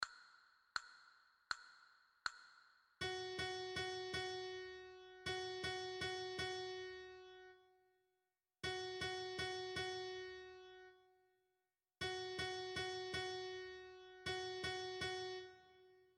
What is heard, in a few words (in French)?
Fa diese, flute a bec